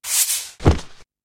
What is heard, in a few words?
Out Animations Clean